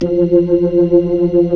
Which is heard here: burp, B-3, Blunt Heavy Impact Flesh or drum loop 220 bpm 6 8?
B-3